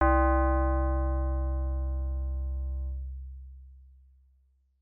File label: Coin Insert; Off the Wall